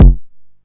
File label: Bass Drum, Jomox Xbase-09; Drums